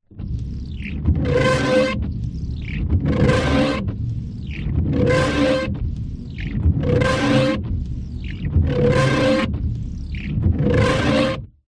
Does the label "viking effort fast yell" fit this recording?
No